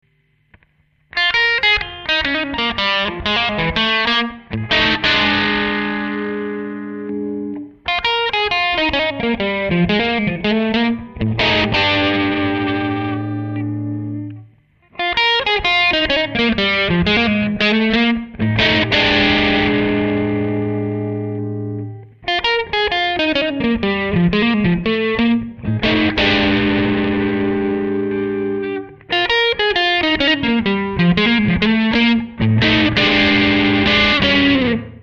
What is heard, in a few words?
crunch